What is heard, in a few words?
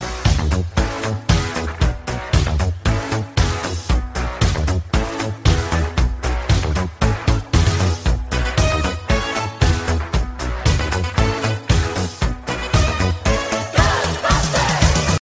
trailing music ringtone for mobile